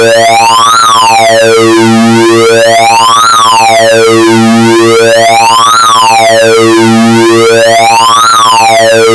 vco 1 sqr synced to vco 2 vco 1 lfo modded vco 2 steady, analog synth; analog synth 101